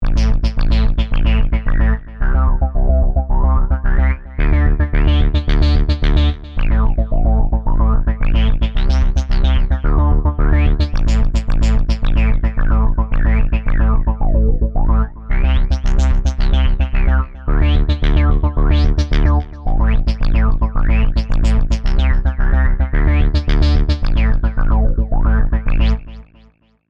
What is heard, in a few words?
Waverunner 2.0